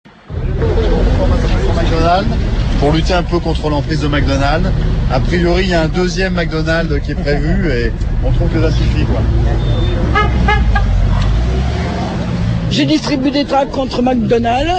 Manif Anti Mac Do ambiance

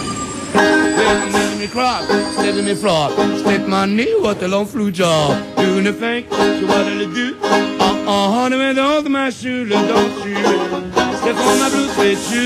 Chanson et banjo

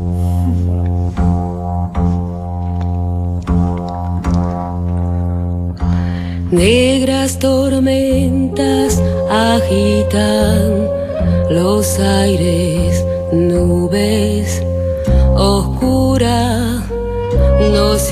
Chanson anarchiste espagnole

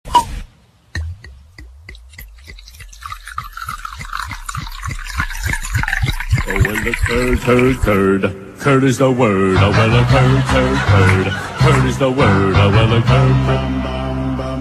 Chanson

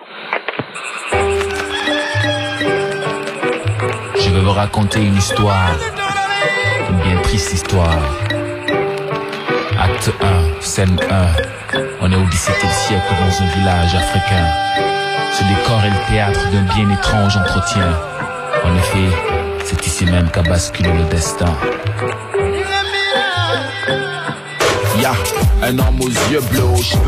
Chanson rap senegalais

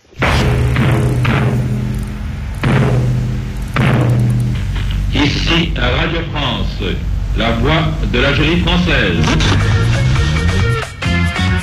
Mix radios latinos

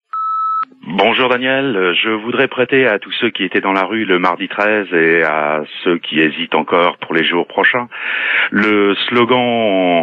Manif retraites slogan repondeur

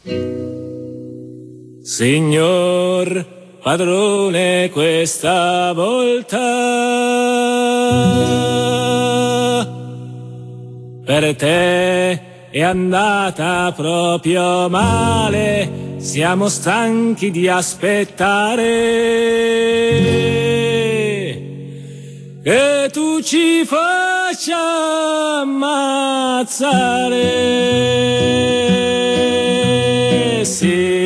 Chant révolutionnaire italien

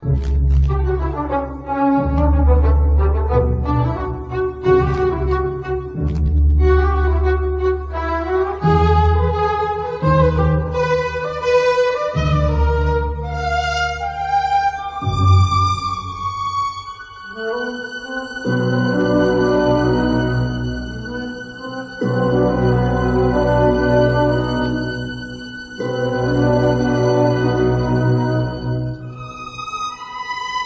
Musique classique

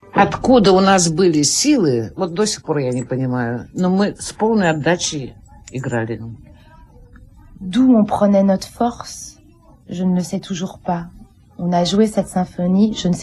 Siege Leningrad concert

